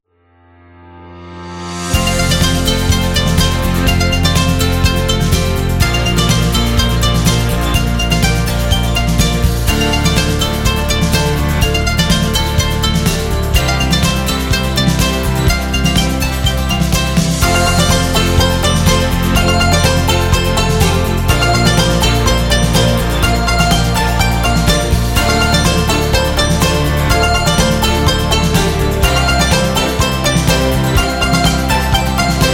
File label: Chinese New Year Festival